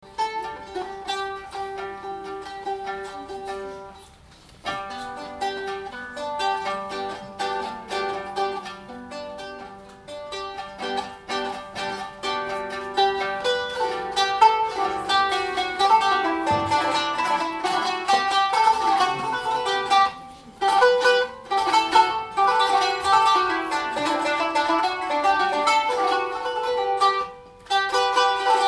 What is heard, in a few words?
qanun